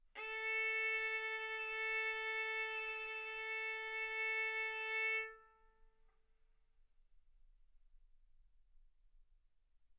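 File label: Violin arco mf A string A4 B4 stereo; sul A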